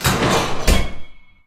cube trapdoor open; underground

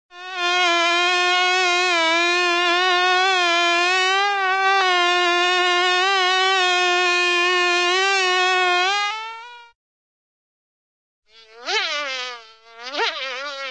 fly single fly buzzing, animal, insect; cartoon

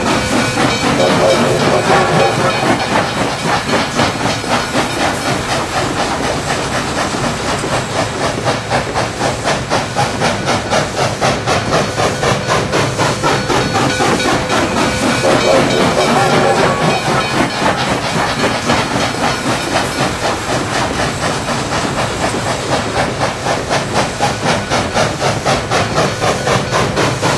slow train loop stereo; Source Engine; tf

